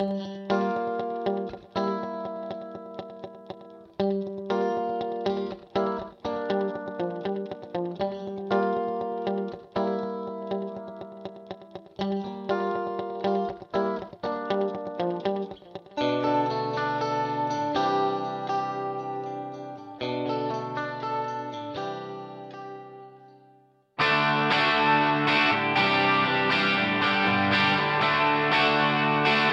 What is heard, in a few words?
Guitar Eventide H8000 Guitar Magic; Vintage Digital